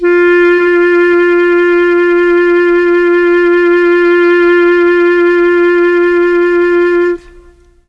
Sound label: CLARINET F3, Mellotron; Mike Pinder - Mellotron Archive CD; CHM CLARINET